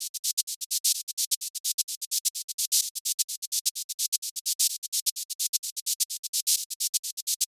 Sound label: 128BPM Drums; Vengeance Samples; Control Kit